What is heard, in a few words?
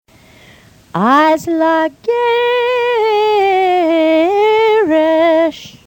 Haftarah Azla Geresh